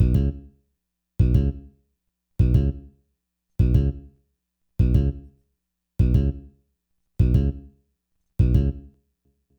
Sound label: Bass, 100 G